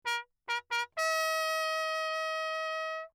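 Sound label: trumpet mute signal; Perinétventiltrompete; Lechner; JoRal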